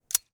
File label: gun without bullet